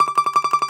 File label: DOMRA